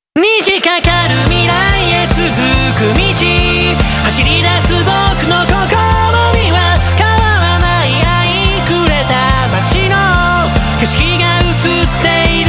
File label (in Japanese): 男性楽曲サンプル